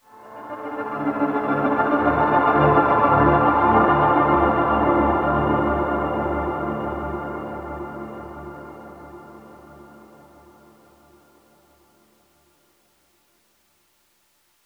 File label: Tonal FX D 90 Bpm, Granular Tonal FX, Lo-Fi Kit; HipHop; Ghosthack; Advent Calendar